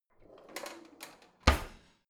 old drawer creaky slide in

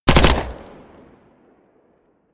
smg uzi 45 close burst

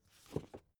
book take shelve hard

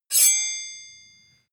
sword blade grind short ring

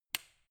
switch turn off

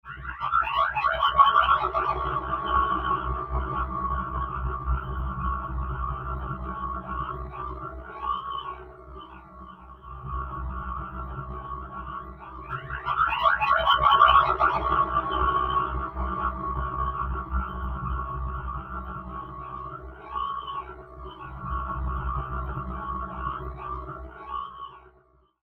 crazy gurglin

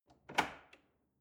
drawer large trash draw out lock

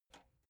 metal button spring release

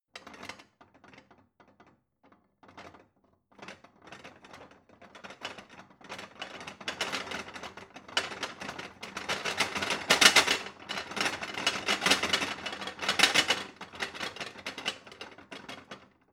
plates rattle

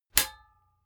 sword metal hit bright

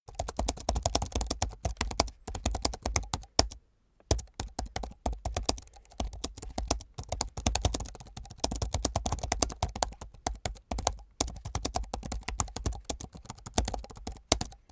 keyboard laptop type sequence fast hard

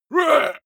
male shout hit short

